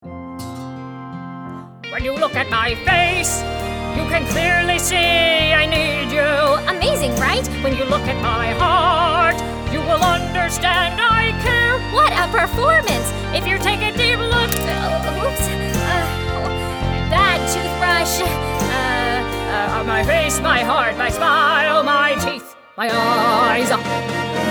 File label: vocal